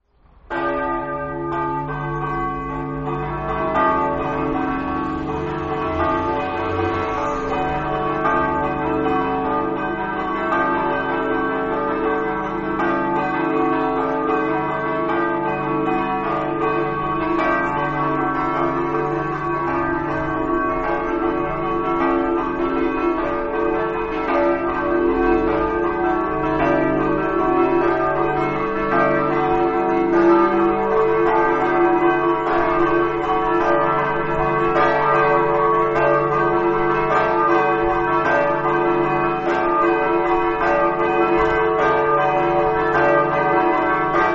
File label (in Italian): campane; sangiovanni